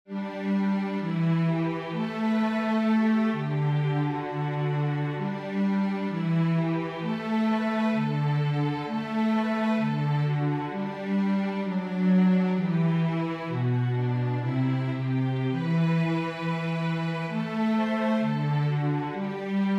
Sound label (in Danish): bas